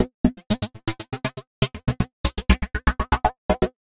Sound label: Techno Synth